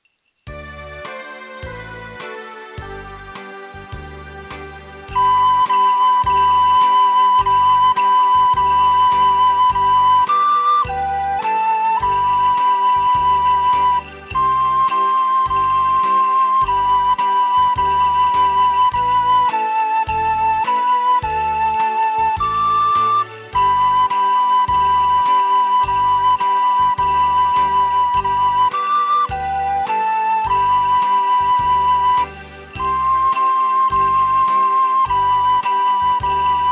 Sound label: Jingle Bells